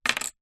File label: household keyring plastic drop wood